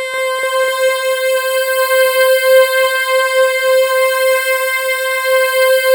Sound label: BIG PPG, OLD PPG; Sound Gallery - Charged